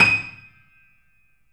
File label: D#, 3D Pianos, Boesendorfer; E-MU Producer Series Vol. 5; 3-D Audio Collection